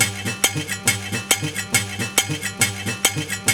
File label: HARP SHAKE, HARP+SHAKER; Zero G - Ethnic